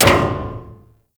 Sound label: Ship Hit; RailJack Hit Effects